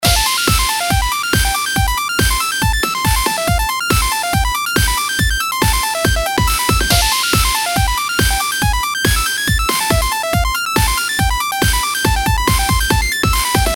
シンセ音作りサンプル アルペジオ原音

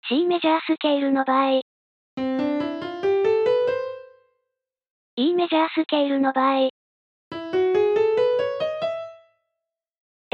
スケール比較

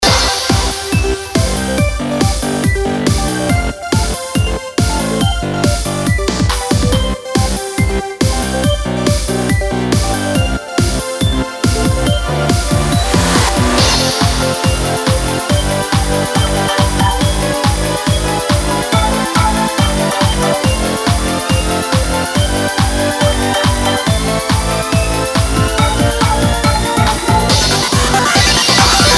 変調とサイン波を使ったＡＢまとめ

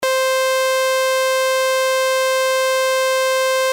ノコギリ波

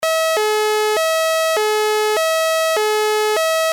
LFO 四角波

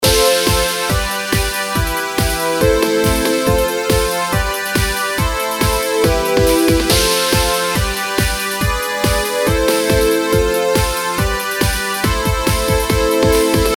シンセ音作りサンプル attack pad

